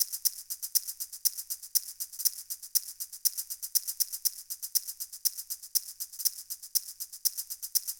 World Maraca

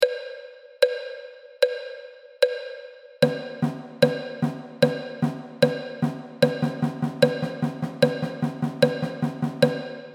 Rock

